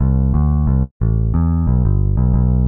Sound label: Bass 90 bmp, Jazzy Bass; C-B